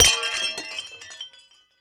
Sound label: slow smash